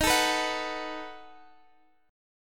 B 7th Eb

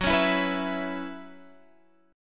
F sharp 7th Suspended 4th, guitar

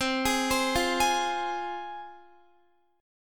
C Suspended 4th Sharp 5th, C, down, Guitar

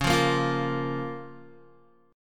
Db 6th, Guitar; Db6 strummed; JGuitar

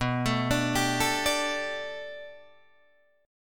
B Minor 7th Sharp 5th B Guitar DADGAD, chord

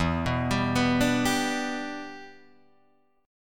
E Minor 7th, Guitar, Drop D

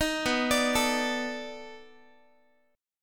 B 7th Sharp 9th, down, Guitar; B7#9; arpeggiated; 18,19,17,19,x,x

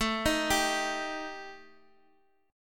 Eb Major Flat 5th A

